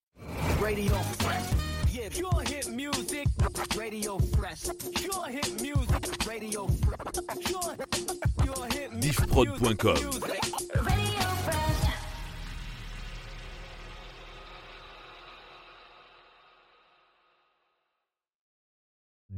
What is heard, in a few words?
Hip Hop 96bpm backing vocals; Rampe HipHop 96bpm